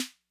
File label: Snare Min Tone Max Snap, 808; classic machines